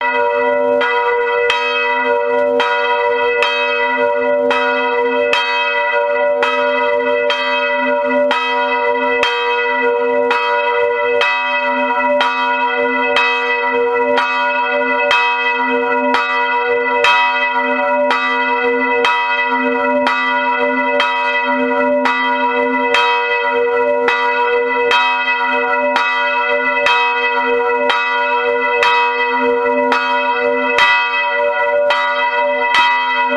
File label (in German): Glocke II, kirche